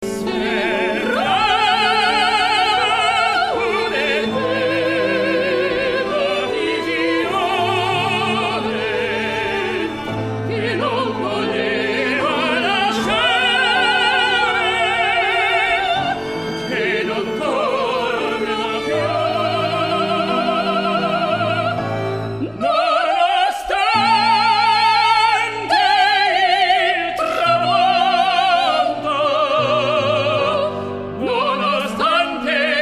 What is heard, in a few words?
Al Tramonto duet; Enchanted April